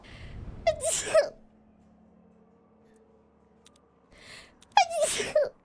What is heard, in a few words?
Sneeze